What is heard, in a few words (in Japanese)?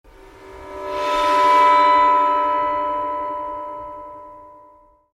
心霊的な何かが…いる